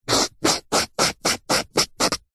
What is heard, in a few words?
foley foam mark score or draw on